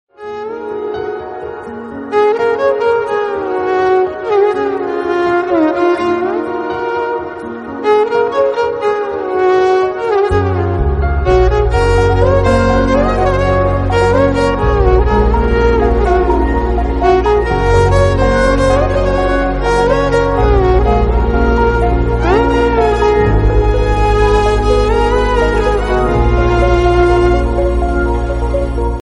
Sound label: lovely violin sad background music tone; iphone ringtones